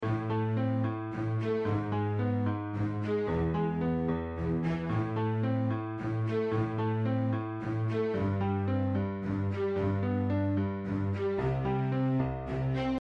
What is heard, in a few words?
Triolets CELLOS I CELLOS II DB; Beethoven - 7e; MES 75-82